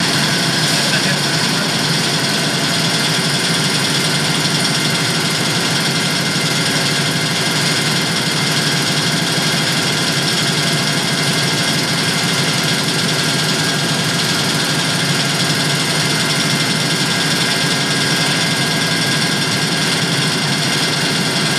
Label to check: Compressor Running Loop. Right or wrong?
Right